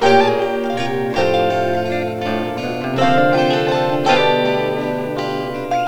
cadence

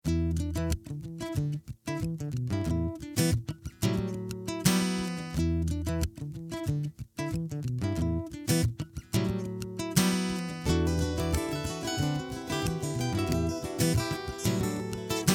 permute 16th

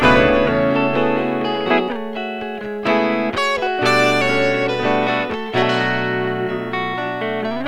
doiing

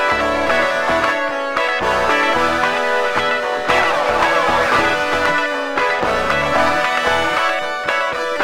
funky

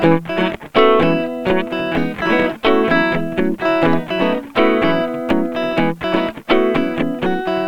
doiing

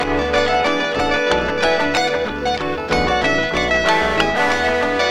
ride

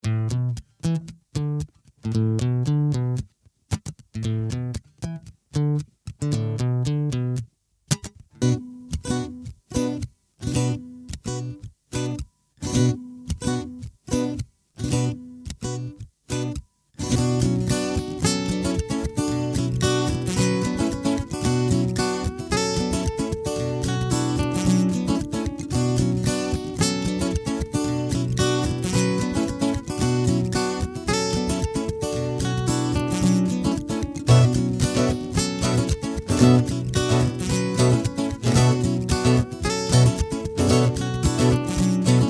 permute 16th

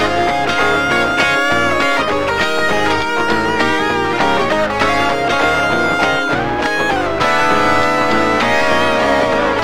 riff

